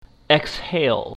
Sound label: exhale